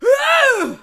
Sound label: Attack Vocals